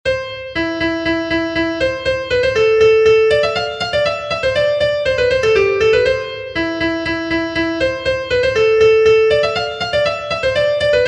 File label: Polska